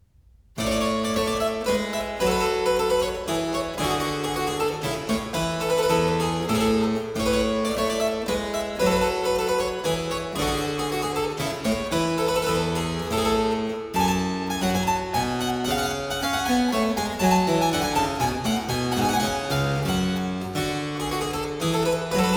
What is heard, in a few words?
Hornpipe, harpsichord; Complete Chamber Music; Brilliant Classics; Miscellaneous pieces for the harpsichord